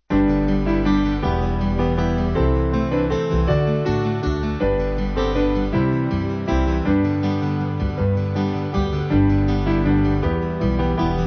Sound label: Band; Gather Around; Skye Boat Song